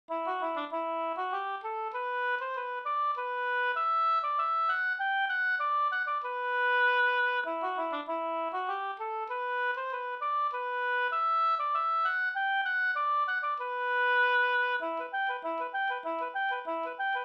slängpolska; Lastringe latar

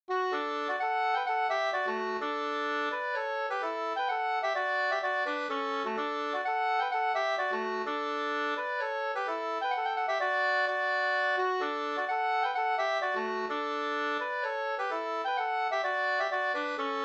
polska; e Lovhog-Per Hj

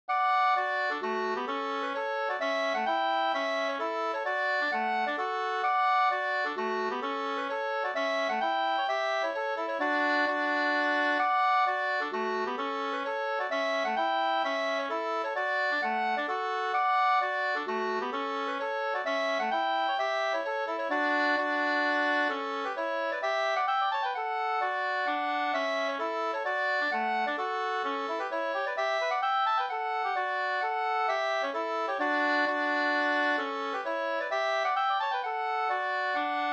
hambopolska

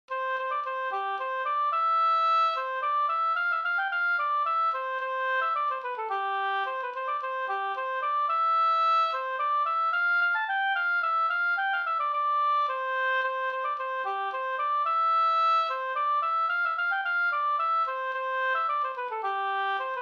slangpolska

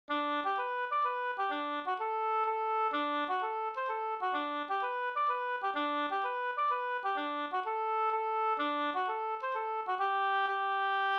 hambopolkett; Gubbstot e Finnmark Hellstrom Og V1

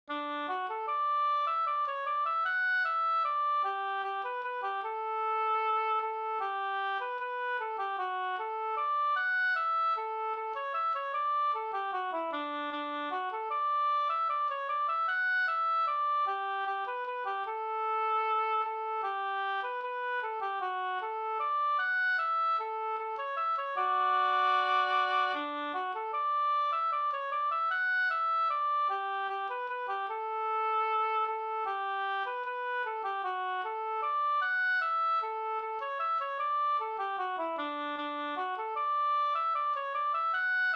skanklat

